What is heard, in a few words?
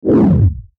Whoosh Bass Distortion; Blastwave; Whoosh Sound Effects